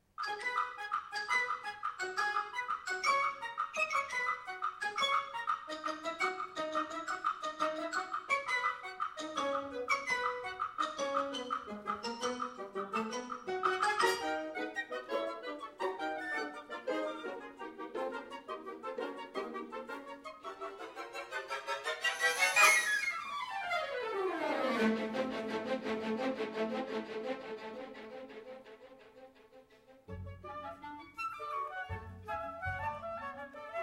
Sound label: Flute Concerto